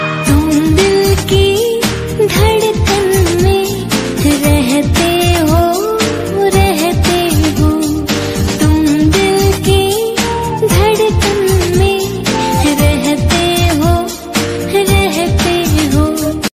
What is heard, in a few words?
Female Song Ringtone